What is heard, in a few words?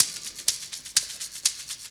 mix percussion loops, 125